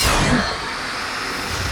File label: fx cinema